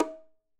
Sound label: bongos Single Hit Flams; raw perc one shots; SEISMIC Drum Sample Pack; Slate